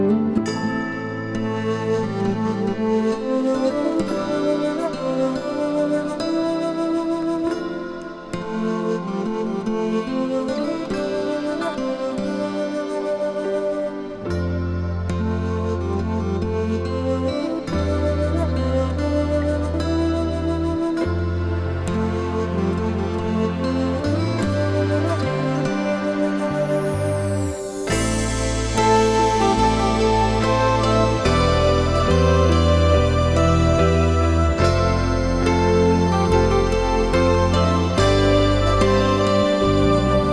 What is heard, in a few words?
A Minor Tune